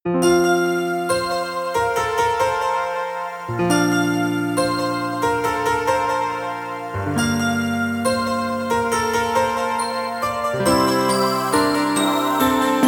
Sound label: Bells And Bells Main Track